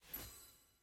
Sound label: sfx book highlight; tft set16; hub